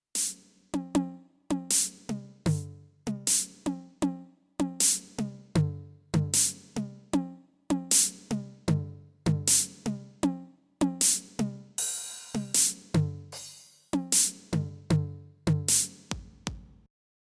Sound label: Millenium MD 100 Mobile Drum